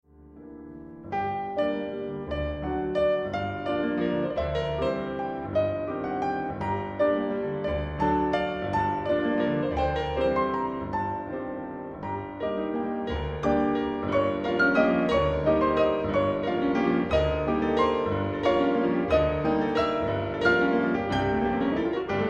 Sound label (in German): walzer